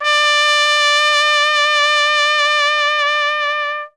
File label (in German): TRP WEICH, Alpine Volksmusik; Best Service